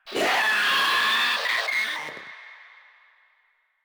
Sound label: Proto Infested Runner Vocal Attack; Warframe